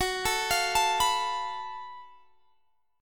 F sharp Minor Major 11th, Guitar, Drop D; chordshape; down

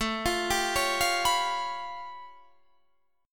A Augmented 9th A, Guitar Standard; arpeggiated; JGuitar; 17,20,17,18,18,19

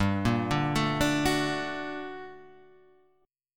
G Minor Major 9th, guitar chord

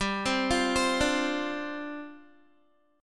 G 7th Suspended 4th G down Guitar

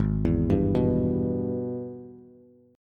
Minor Major 7th Sharp 5th, Bass; G#mM7#5; arpeggiated; 4,7,5,4

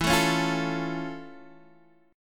F Augmented Major 7th E

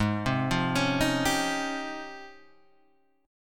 Db Major Sharp 11th Ab

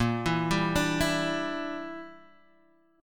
A sharp 6th Flat 5th, Guitar Half Step Down

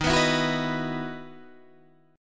E Major 7th Suspended 2nd Eb

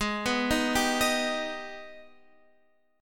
Ab Minor Major 7th chord

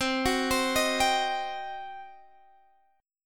G Major 7th Suspended 4th Sharp 5th C

